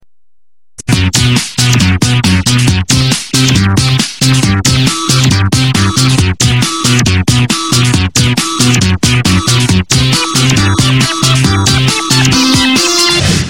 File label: Retro Game Title